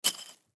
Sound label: Snow Bottle Set Down; Ice; Weather; Ice Sound Effects; Blastwave